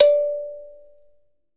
THUMB PNO, Kalimbas; E-MU Producer Series Vol. 7 – Old World Instruments

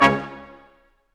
BRS Quintet stac; Roland L-CD702; Quint.Stacc

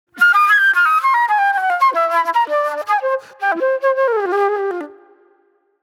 Raw Funk Flute

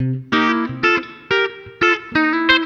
COUNTRY

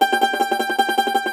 cumbus saz G, plucked strings

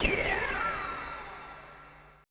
AMBI FX

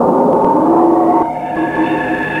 FX, Futurist Drum & Bass

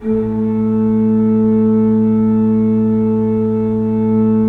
3D Organs Pipe Mix Celeste

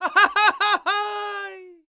LAUGH, World Voices, ANDES; Spectrasonics Vocal Planet

